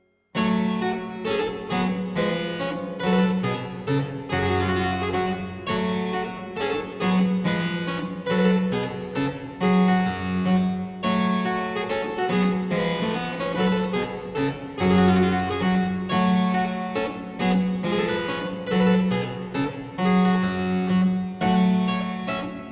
menuet rondeau; marchand louis

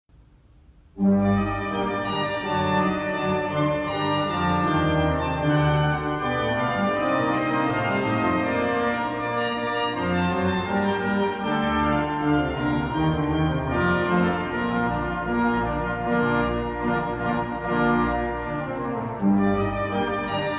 pour orgue

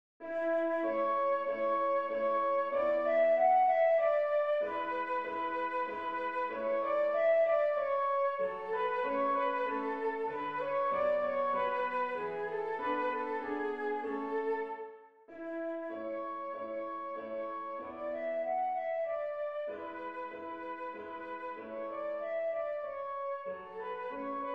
contredanse majeur; beethoven ludwig van

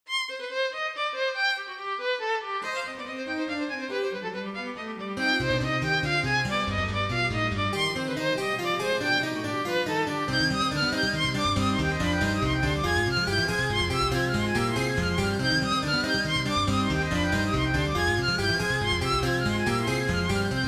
mouvement concerto pour violon

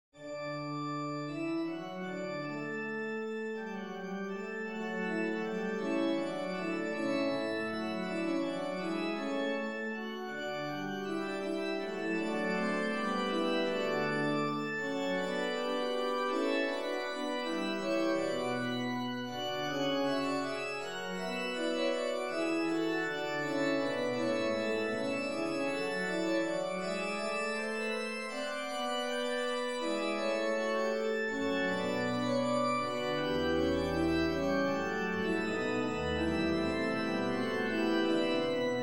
fugue en re majeur; wesley samuel

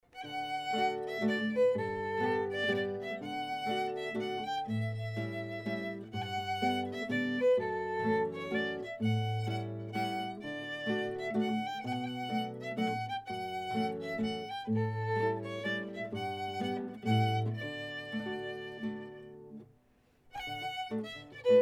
violon irlandais jigue banjo; guitare; chenilles processionnaires

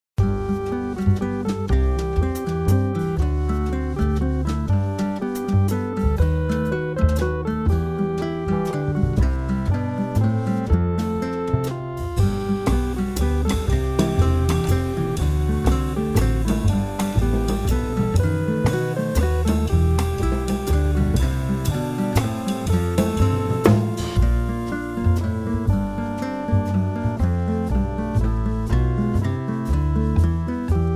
valse noel

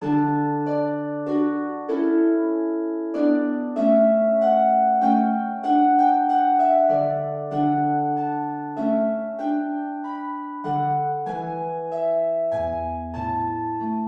menuet majeur; purcell henry

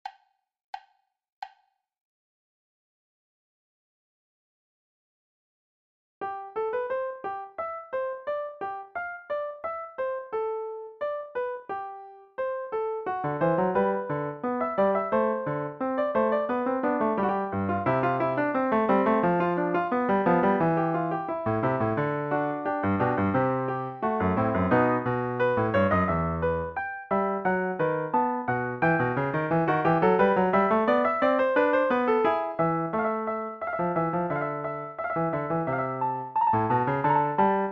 fugue dans style baroque

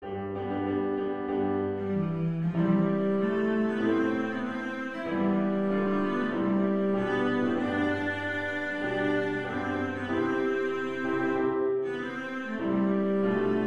hymne national de la Belgique; van campenhout francois

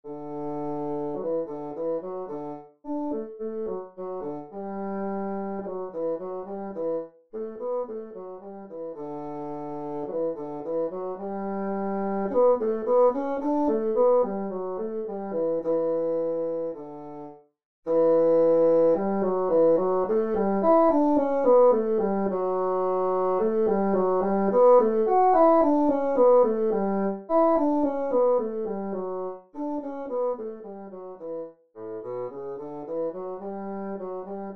sonatine; beethoven ludwig van

